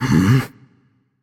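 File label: nonverbal confused; voicepack; smite; merlin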